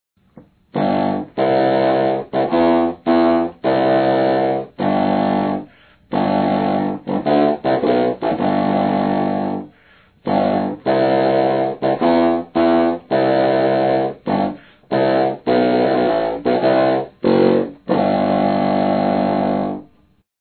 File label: Contrabassoon